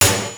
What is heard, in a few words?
DR-660 FX; Rubber Chicken Software Sample Bank